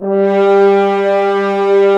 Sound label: Brass F.Hrns G; Miroslav Vitous - Woodwind Brass Ensemble